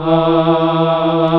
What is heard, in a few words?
choir